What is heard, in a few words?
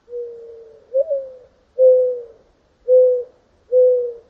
mouring dove; ambient